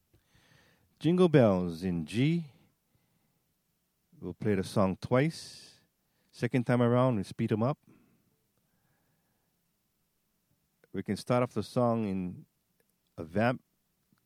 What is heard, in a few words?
jingle bells in g